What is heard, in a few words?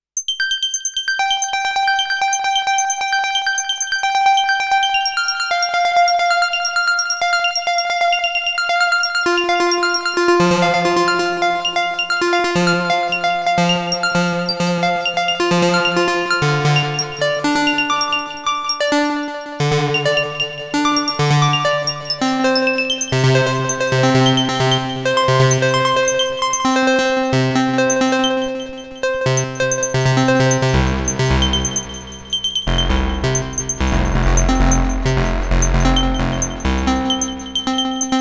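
High Frequency Spectre; Super Gemini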